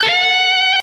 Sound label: pheedback, stabs